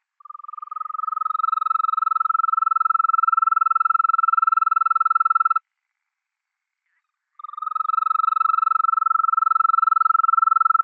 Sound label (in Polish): ropucha; karty obrazkowe; przygotowania do zimy